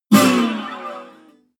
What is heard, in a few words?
bottleneck strings slide down noise